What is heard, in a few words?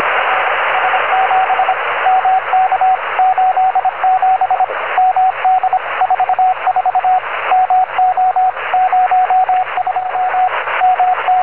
cw telemetry 10m downlink; rs-7